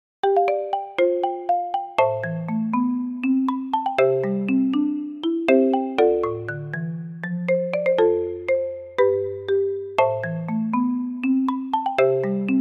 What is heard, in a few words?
Marimba